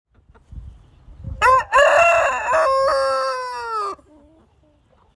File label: Rooster crow